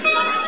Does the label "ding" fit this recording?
Yes